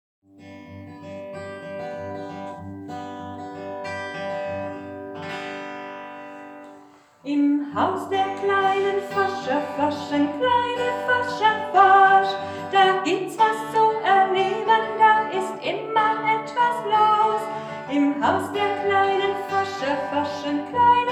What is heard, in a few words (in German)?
Forscherlied; Westerheim; Kindergarten